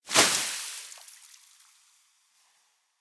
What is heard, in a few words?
Water Bucket; Prison Architect